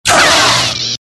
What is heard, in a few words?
saber block; effets speciaux; Blocages